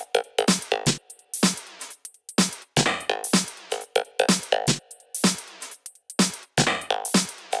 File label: 126BPM Pong Kit